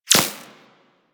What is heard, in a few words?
Laser Impact Default; Warframe; Weapons